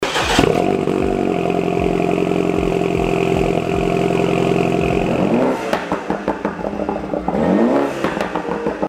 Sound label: Burbles Sound Example